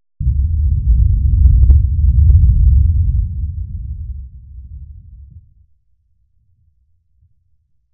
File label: Explosion, 5.1 Surround; Sony Pictures Sound Effects Series; SUB